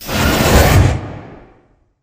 sliding door sm verb close slide; beacon; Titanfall 2

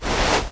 Grunt Mvmt FOL Soft Slow Cloth; Titanfall 2

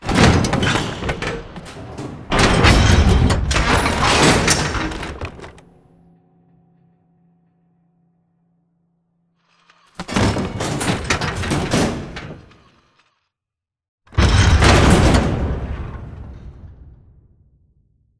dog whistle pulse success mech close; boneyard; Titanfall 2

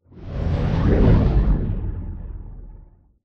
deploy from mega; Titanfall 2

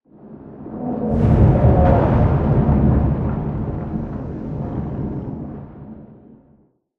Explosion Rumble Interior Close Wash Tail; Boomtown; Titanfall 2

